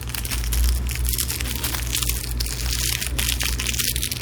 Tieng vay vo to giay bang nhua nilon

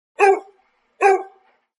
tiếng chó sủa messenger

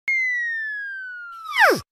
tieng ten lua roi; meme

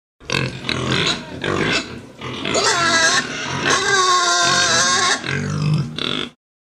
Tieng con lon keu un in

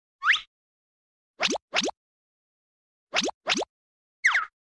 Tiếng chớp mắt

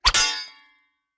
Tieng 2 thanh kiem va cham voi nhau 1 tieng

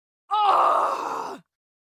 tieng dau don gao thet cua nguoi phu nu

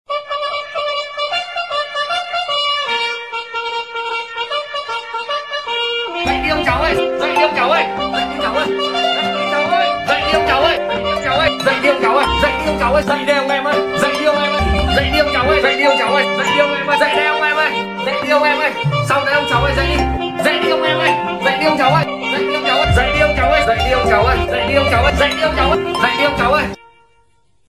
Nhac chuong bao thuc nghe la phai day

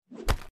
am thanh cam lay nhat luom do vat

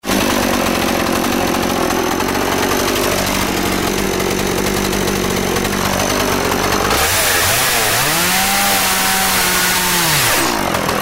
Tieng xich may cua go keu rat to

